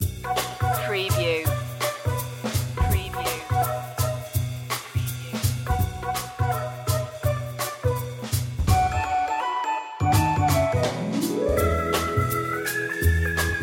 retro showroom loop

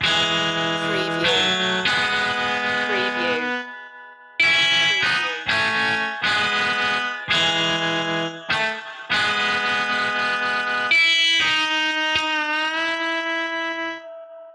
sixties flower power loop

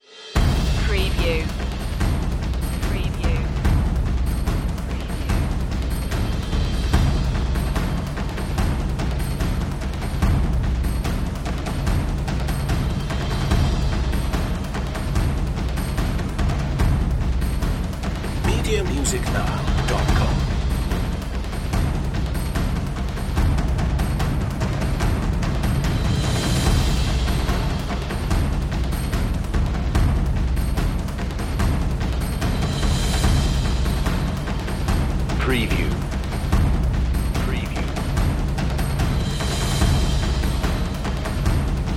perc mix

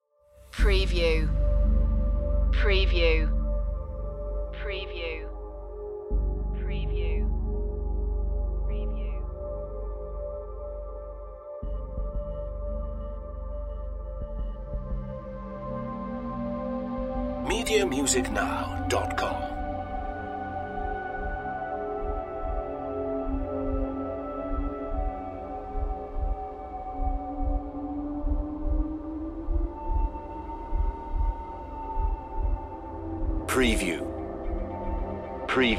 dark ambience

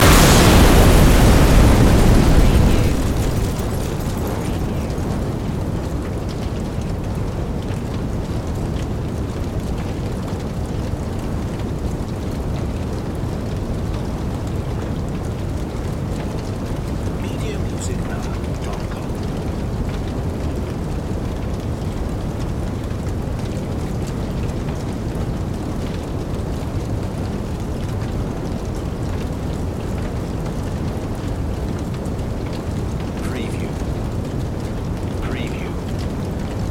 EXPLOSION FIREBALL FLAMES FIRE

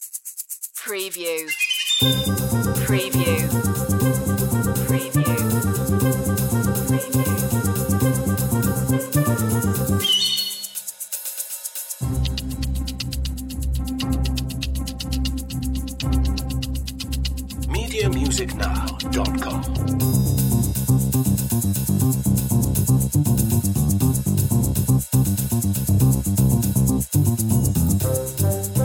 no marimba no piano

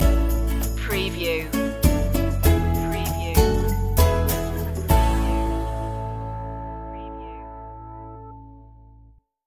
once in a lifetime stinger

